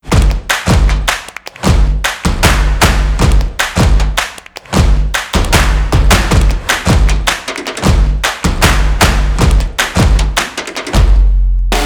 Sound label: Drum and Bass Clap Stomp